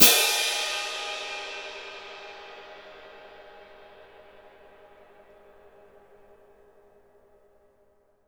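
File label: cymbal, Orchestral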